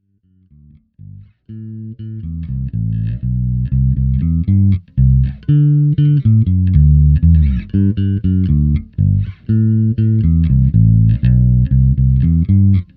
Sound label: svt style